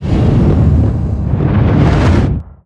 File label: death; dota; abaddon